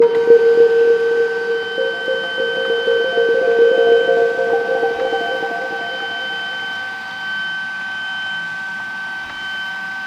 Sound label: Loading